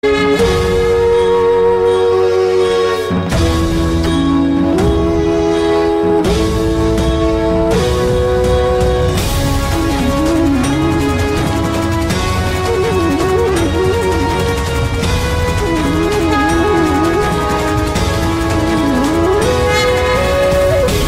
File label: Flute Calm; Shani